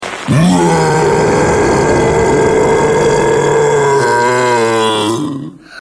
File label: Growl; Totoro